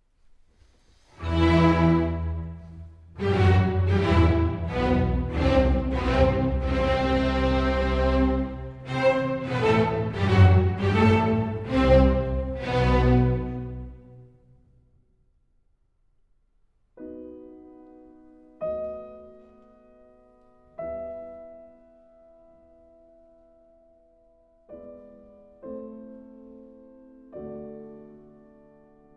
Piano Concerto in G Major, Andante Con Moto

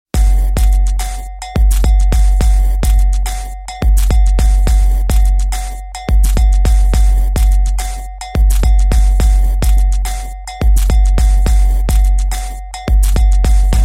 Instrumental

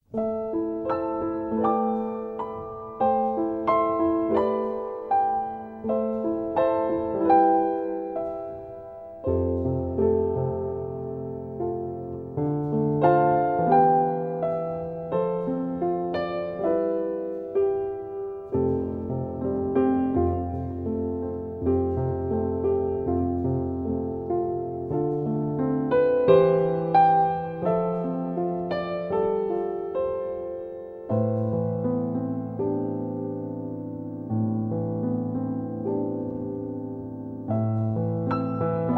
Piano Version

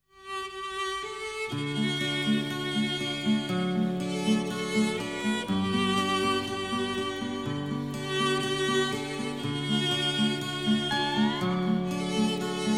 The Accordion

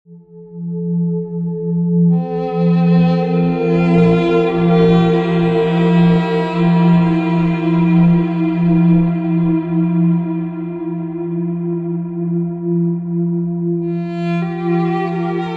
432 Hz Sound Therapy, Deep Relaxation (432 Hz)

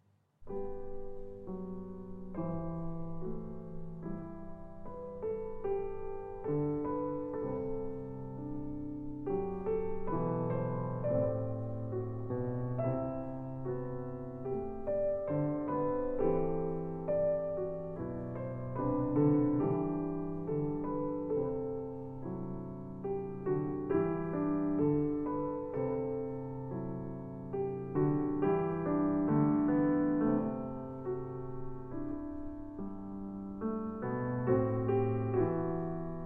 Hymn Tune Prelude; on Song 13 By Orlando Gibbons

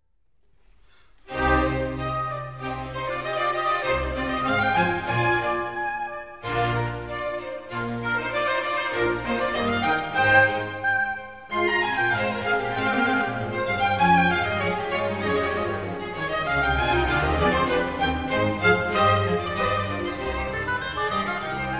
Concerto for 2 harpsichords & strings in C minor; BWV 1060

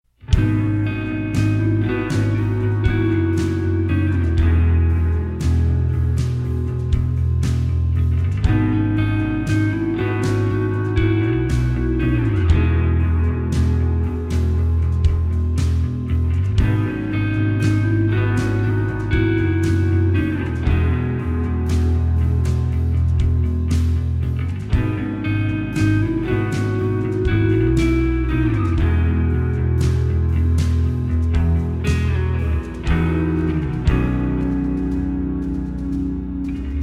(Instrumental)

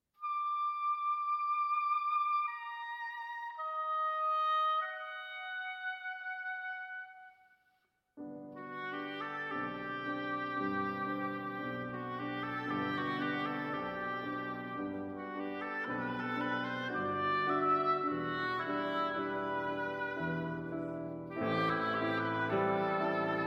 Oboe Sonata, Élégie

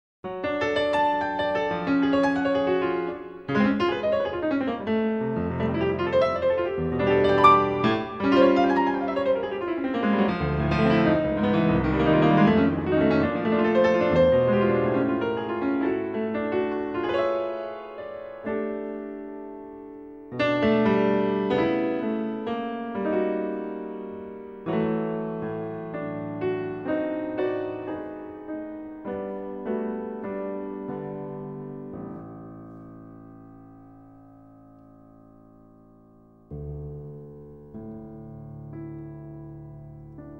Piano Version

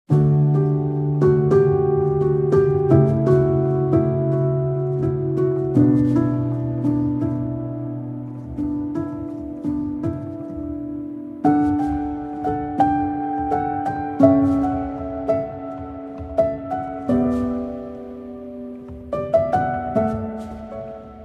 Piano

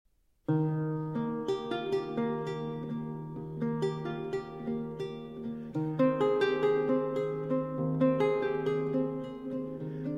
Cello Suite in G Major, Prelude; Cello Suite No. 1; BWV 1007 I.

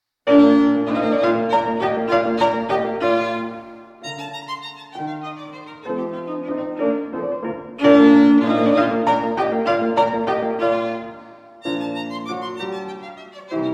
Violin Sonata in G Minor, Menuetto; Violin Sonata No. 3; Op. 137 No. 3, D. 408

